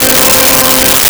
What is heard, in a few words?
Pipe Organ C5; Nintendo; July 2020 Gigaleak